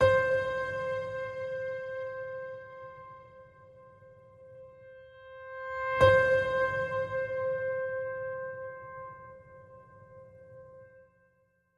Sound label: Piano Note C